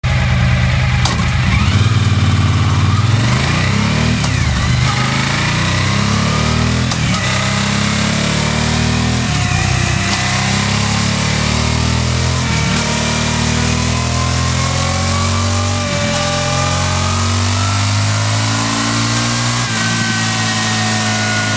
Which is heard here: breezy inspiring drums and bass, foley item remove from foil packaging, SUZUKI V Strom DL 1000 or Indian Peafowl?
SUZUKI V Strom DL 1000